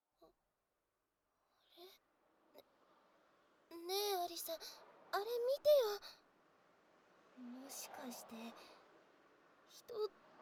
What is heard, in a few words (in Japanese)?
ギャルJKの蘇生耳舐め